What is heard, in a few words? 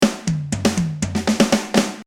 Flam Fill